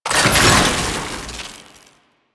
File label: Grenade Deposit Electricity; Alchemy; Warframe; Entrati